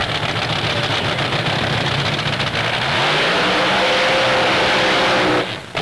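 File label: nitro burn, drag racing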